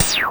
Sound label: Bit Flop; Zero-G; Soundclash; Acidized Wavs